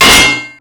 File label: hydrant, Explosions; Call of Duty Modern Warfare